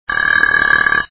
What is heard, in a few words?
riiing; Pacghost